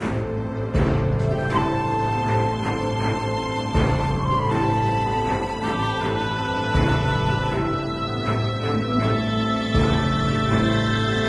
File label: Dungeon